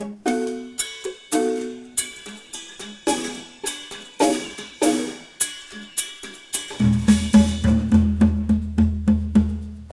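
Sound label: african drum roll accompaniment; acoustic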